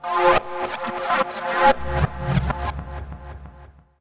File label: Windscissor Loop, Drum Loops Processed; Zero-G Smoke